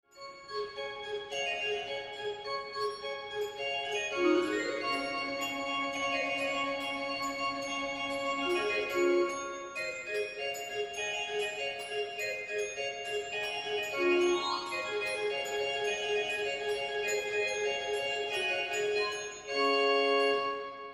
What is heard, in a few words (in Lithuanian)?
Cymbel; Kartena